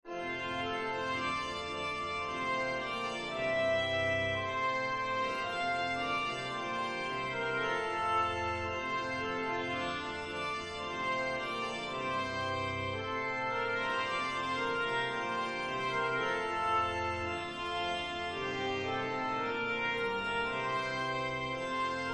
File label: Trumpet; Christ The Lord Is Risen Today